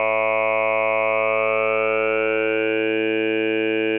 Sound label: formants